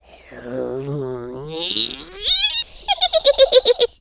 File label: Witch Laugh; horror; horrorgifs